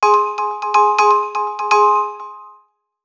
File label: Alert; Olive; Sony Ericsson; Venus (Xperia X1)